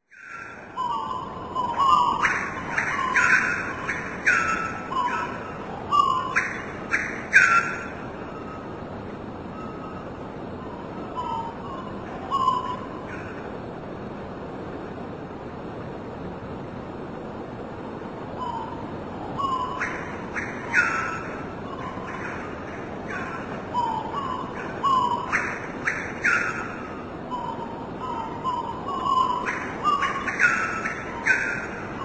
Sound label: south america morning ambience